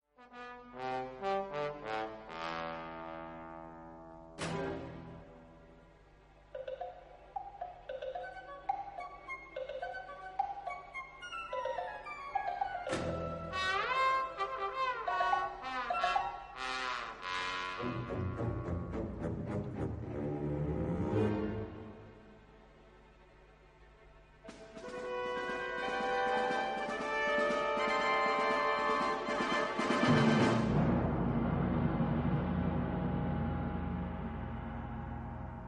Trombone Concerto

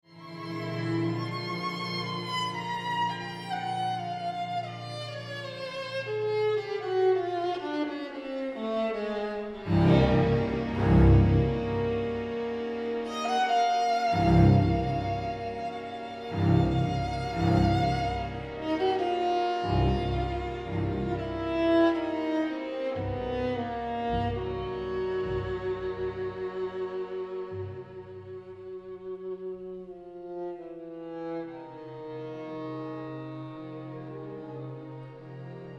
Viola Concerto

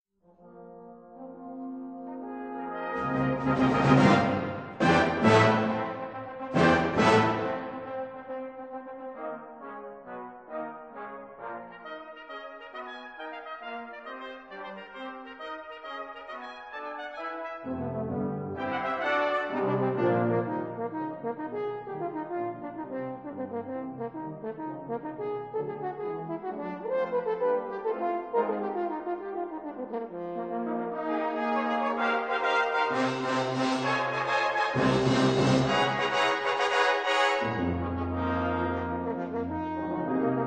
Horn Concerto 3rd Movement

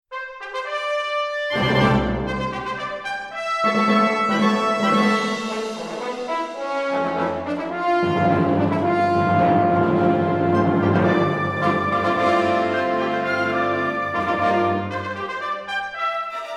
Tuba Concerto Allegro Deciso

